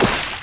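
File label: splash; The Elder Scrolls III Morrowind GOTY; XBOX; FOOT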